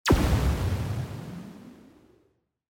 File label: Laser Fire; castelloe